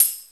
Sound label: TAM